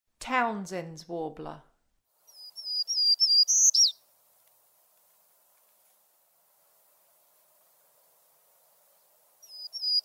Townsends Warbler, Songs of Birds of North America; Western USA; Western USA Songbirds